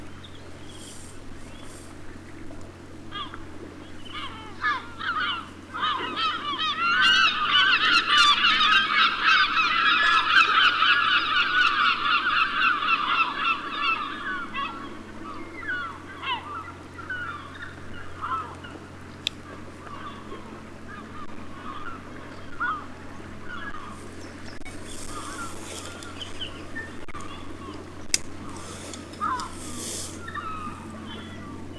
dominikanskaya chayka larus dominicanus

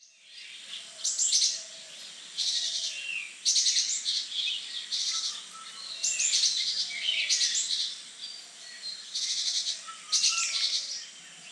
seraya sinitsa parus cinereus, zvuki peniya ptits

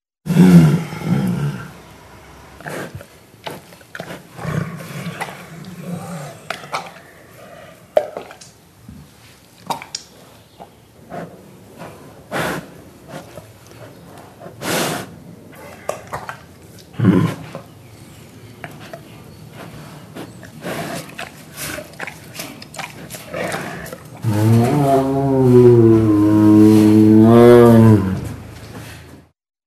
ryk sibirskogo tigra; bbc

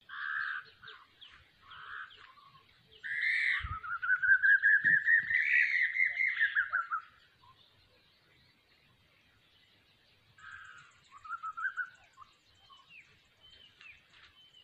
chyornaya kukushka cuculus clamosus, zvuki kukushki

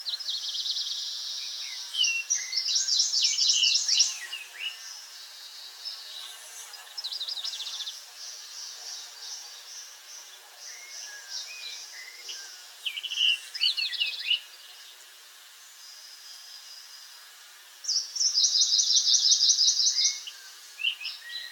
kitayskiy drozd turdus mupinensis, zvuki peniya ptits

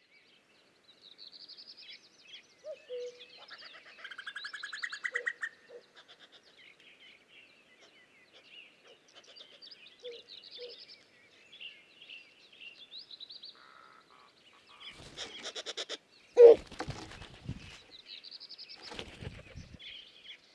kukushka cuculus canorus, zvuki kukushki

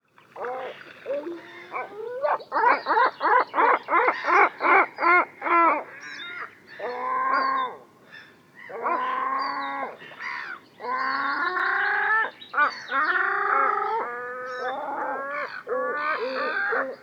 morskaya chayka larus marinus

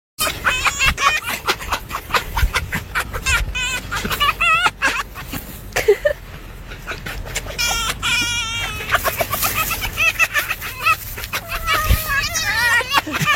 lis smeyotsya; zvuki lisy

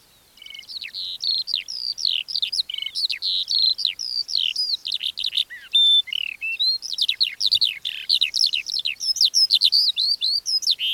polevoy zhavoronok alauda arvensis, zvuki peniya ptits

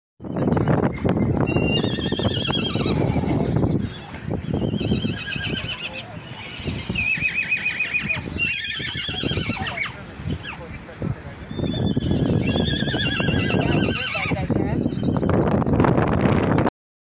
zvuki zherebyat, zvuki loshadi i konya

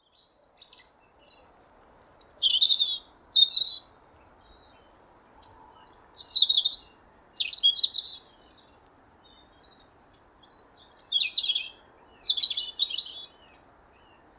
zaryanka erithacus rubecula, zvuki peniya ptits

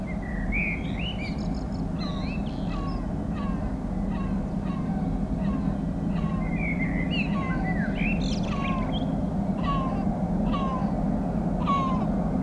morskaya chayka larus marinus

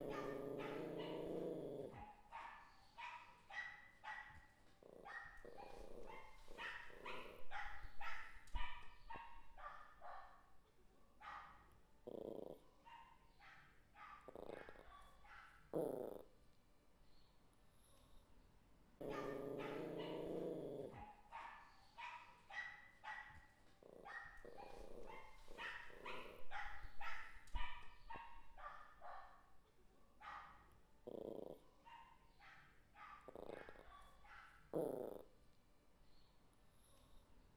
zvuki rychaniya sobak

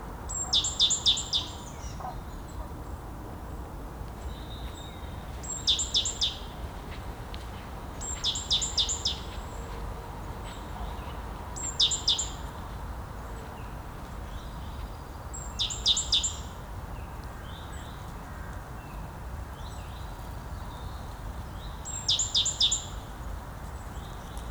bolshaya sinitsa parus major, zvuki peniya ptits

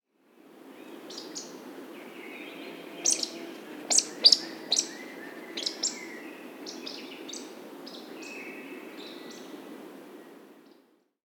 valdshnep scolopax rusticola, zvuki nochnyh ptits

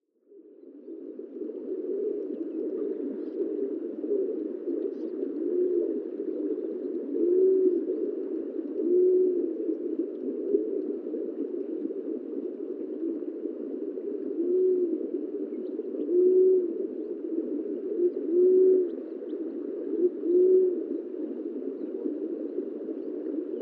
efiopskiy golub columba albitorques

